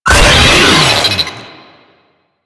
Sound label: Brachiolyst Player Spotted, Vocals; Enemies; Warframe; Proto Infested